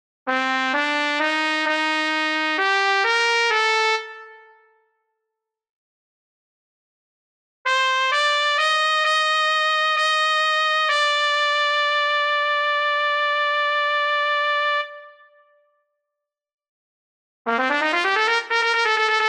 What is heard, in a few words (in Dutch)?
Trompet in Bb